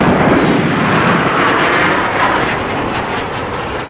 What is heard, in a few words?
rpg7 rocket fly